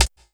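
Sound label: snare drum, Drum & Bass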